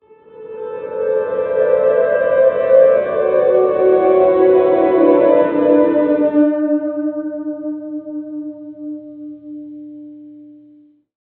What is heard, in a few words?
guitar abstract reverb